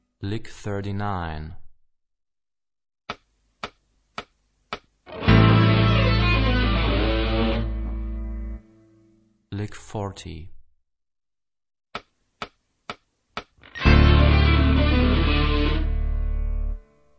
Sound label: Rock guitar; Rock gt Secrets